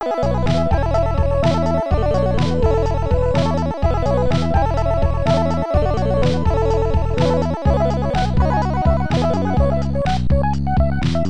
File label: Level Complete; qix amiga